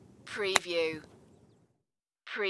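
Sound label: Pitching Wedge Shot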